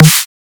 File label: snare whiplash; drums; base pack